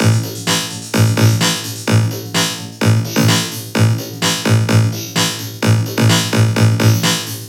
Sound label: VFX2 Loop 128 BPM